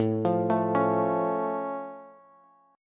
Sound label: A Augmented A, Bass